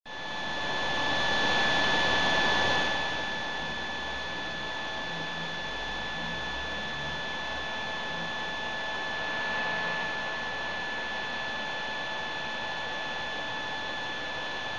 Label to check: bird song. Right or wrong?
Wrong